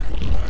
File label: loop, techno atmos